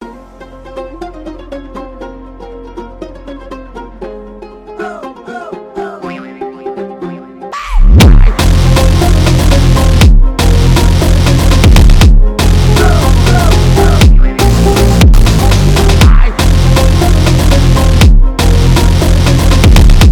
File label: Kazakh Ultrafunk